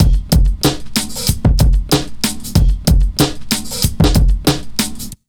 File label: BEAT R, Pure Electro; USB Soundscan vol.03; AKAI